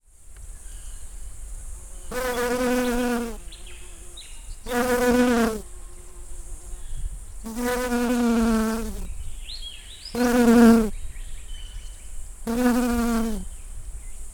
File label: honeybee flight